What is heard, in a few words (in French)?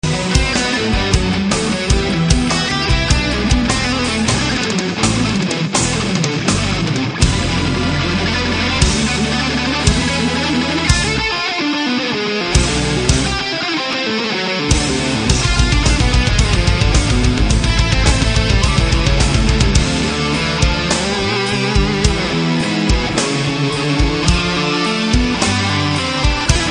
solo guitare